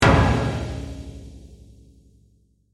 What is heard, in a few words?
Hit Drum; BlastWave